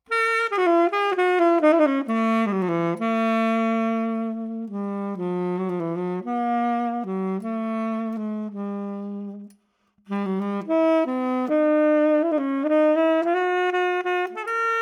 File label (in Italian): TENORE HIGH PITCHED, sax; timbri